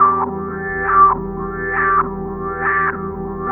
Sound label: Dark pulse, Pads; Sonic Foundry (Sony Creative Software); Synchro Funk